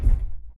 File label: footstep jog; Titanfall 2